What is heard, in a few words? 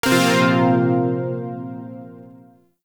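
Shawzin Pentatonic Minor Chord K; Zariman Shawzin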